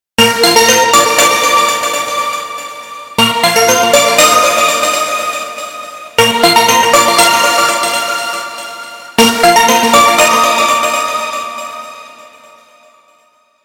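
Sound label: Samsung Galaxy A31 Official Ringtone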